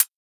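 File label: Dubstep closed hi-hat; Hi-Hats; Ghosthack; Dubstep Sound Arsenal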